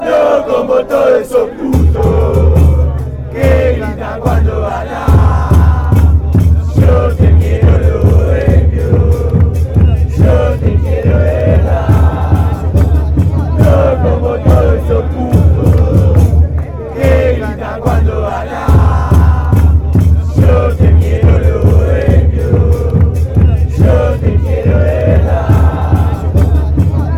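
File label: atlanta chant, football songs and soccer chants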